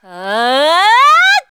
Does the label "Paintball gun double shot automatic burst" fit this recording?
No